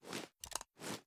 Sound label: sm uzulu reload empty tilt; reloads; iw8; gmod